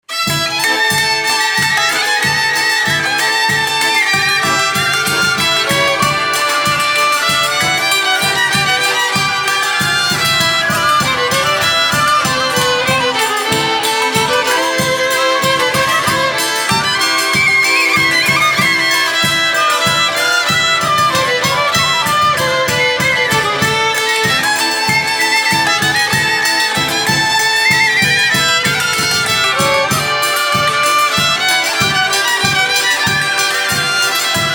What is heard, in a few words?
utoropskij marsh